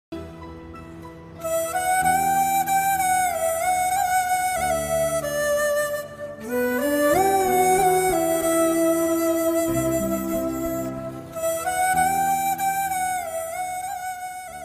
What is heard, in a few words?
love sad music ringtone